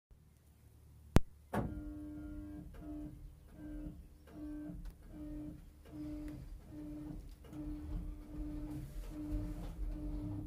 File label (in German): neue glocken